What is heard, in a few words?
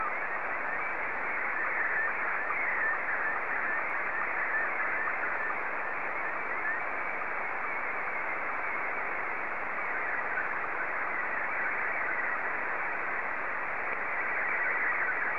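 SSTV, Scottie 2; JA3QJQ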